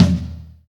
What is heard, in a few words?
small reverb tom drum one shot e key, Royality free tom sample tuned to the E note.; tom drum samples